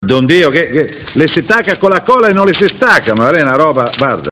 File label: ahahahaahahaha